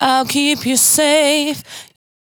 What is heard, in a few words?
92bpm Bb, Velvet Vocal; Zero-G